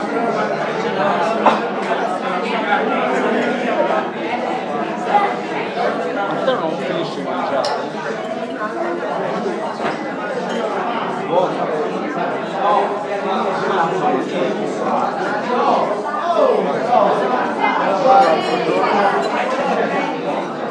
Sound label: the noise made by people while eating